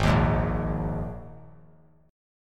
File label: Ab Suspended 2nd; strummed; JGuitar